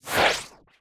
Whoosh

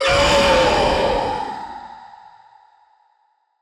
hurt; finelBoss

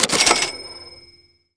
achievement

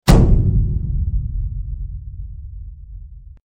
Enemy Skill

